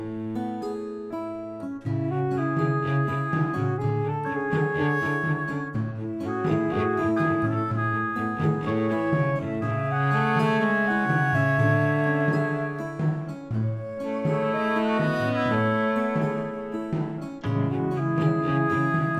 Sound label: Instrumental